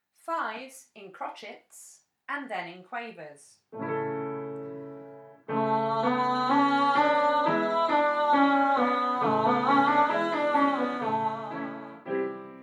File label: 5s in crotchets then quaver